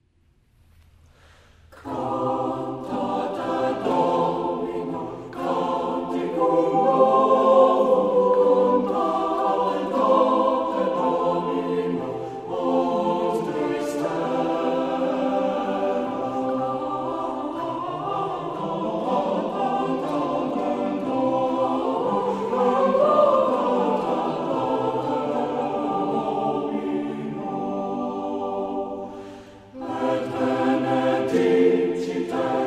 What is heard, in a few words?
Chorale